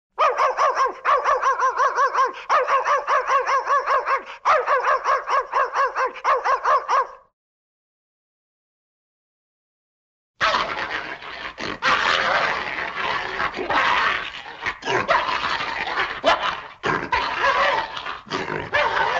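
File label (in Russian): возбужденная собака лает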